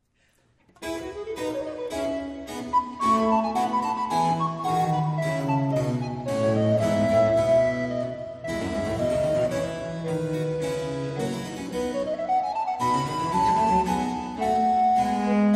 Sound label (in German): Sonate F-Dur 2. Satz; Corelli